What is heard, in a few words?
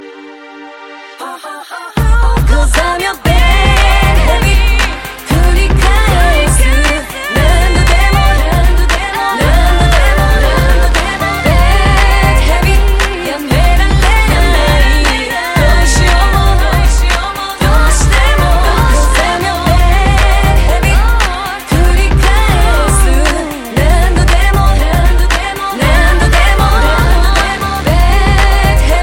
Hardstyle